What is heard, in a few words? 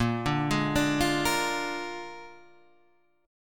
A sharp 7th A sharp